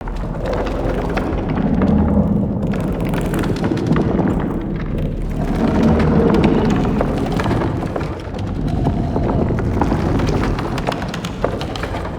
Root Cave Root Large Loop, Ambience; Props; Neathuns Root Cave

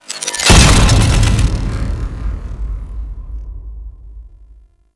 Iron Skin; Warframe

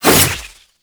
Sickle Whoosh; Warframe; Lasrian Soldiers; Weapons; Lasrian Butcher Sickle

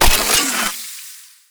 Shotgun Reload; Pax Duviricus

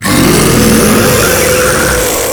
Taunt Scream; Warframe; Proto Infested; Ancient; Abilities

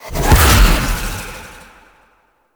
kavat teleport; Warframe; Khora

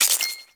Rolo Dex Tap; Ambience; Props; Corpus Ship

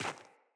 Sandals Run Rock, Footsteps; Player

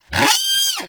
Snow Critter Vocal Pain; Warframe; Hunting Critters

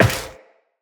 Step Metal, Footsteps, Walk; Characters; Tenno

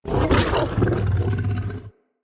Wolf Summon Ghost Appear Roar; Animals

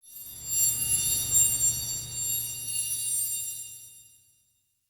Witch Station Ruins Soul Chime

